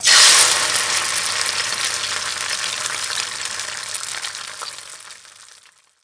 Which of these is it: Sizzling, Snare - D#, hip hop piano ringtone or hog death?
Sizzling